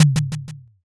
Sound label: taiko